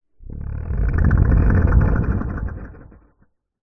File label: human stomach gurgle exagerated